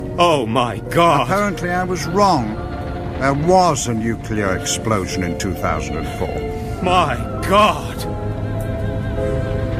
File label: a nuclear explosion; Wall of Darkness